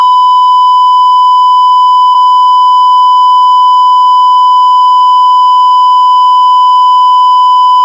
flatline; Barotrauma; Neurotrauma

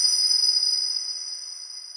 sonar Tail Water Very Close Beacon; Barotrauma; Real Sonar

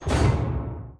docking rail, Door; Barotrauma; barotrauma ek

